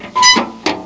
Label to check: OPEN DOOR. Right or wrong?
Right